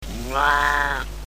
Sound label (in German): paarung, Geparden